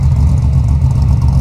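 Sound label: 240zg idle,car engine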